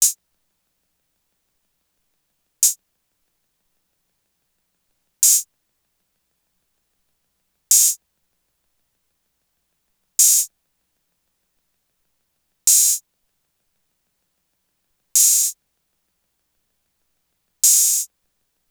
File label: TR-808 Open High Hat; Roland; Instrument samples